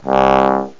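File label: trombone c3, brass; Sounds Terrific II; Weird Science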